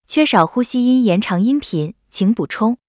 呼吸音延长; XinFeiTingZhen

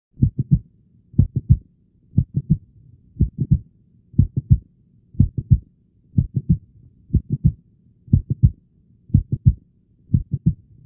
收缩中、晚期喀喇音; XinFeiTingZhen

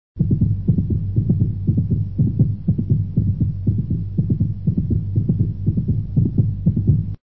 舒张早期奔马律; XinFeiTingZhen

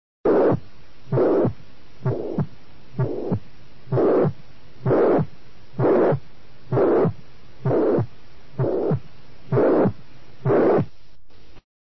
三尖瓣区收缩期杂音; XinFeiTingZhen

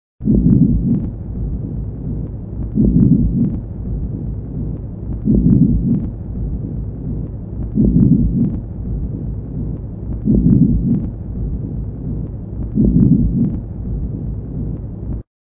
断续性呼吸音; XinFeiTingZhen

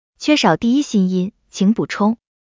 第一心音; XinFeiTingZhen

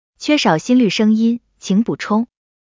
心率; XinFeiTingZhen

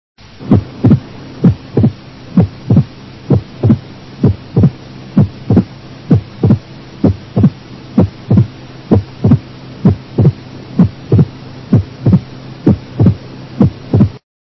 第一心音增强; XinFeiTingZhen

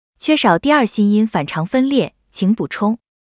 第二心音反常分裂; XinFeiTingZhen

